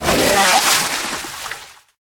gasp

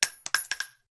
brass, weapons

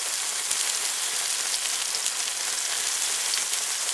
slime

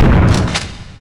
shotgun fire, weapons

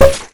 hit

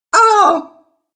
pain

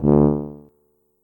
tuba note

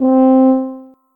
tuba note

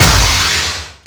electro fire, weapons

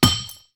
grenade bounce, weapons

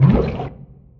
minihealth

